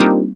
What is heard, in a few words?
syn c, Dance Synths Stabs & Hooks; vol.37 - Dance Synths